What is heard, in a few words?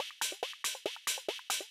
Perc Loop, Club Techno, 140 BPM; Zero-G; Construction Kits